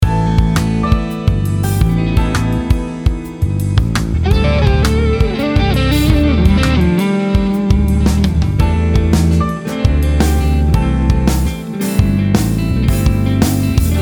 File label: harmony test